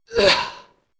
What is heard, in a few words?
die; dairybeatboss